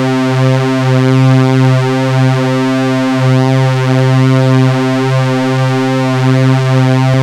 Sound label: MATRIX BASS C3; Zero-G Ambient 1